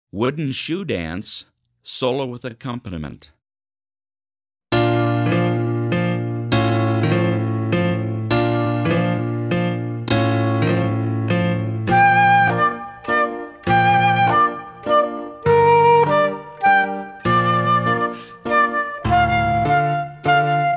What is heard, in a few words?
Wooden Shoe Dance, band